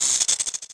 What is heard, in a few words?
rattle